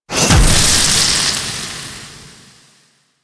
Spit Attack Impact; Warframe; The New War; Archon; Nira; Abilities

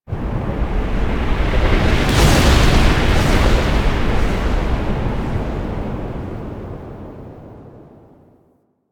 Void Dragon Portal Destroy; Enemies; Warframe; Duviri

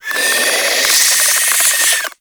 Infested Face Hugger Charge; Warframe

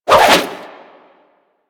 Sword And Whip Spin Whoosh; Warframe

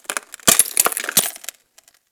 Ocean Glass Crack Small; Warframe; Grineer Ocean

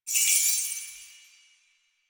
Naginata Whoosh Tonal; Warframe; Weapons; Melee; Koumei Naginata

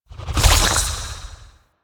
Fluid Sac Tumor Trail Swallow C; Warframe; Infested Eris; Encounters

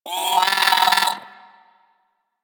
Hyena Micro Vocal Death; Warframe

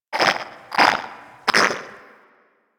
Garden Animal; Warframe; Clan Dojo; Dojo Garden Animals; Ambience

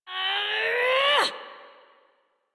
Exert Adult, Melee; Warframe; Duviri; Drifter

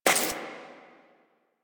Screen Glitch

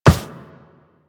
Walk Metal, footsteps; Warframe; Operator

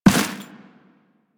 Run Dirt, Footsteps; Warframe; Teshin

